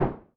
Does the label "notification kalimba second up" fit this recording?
No